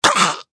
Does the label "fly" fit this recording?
No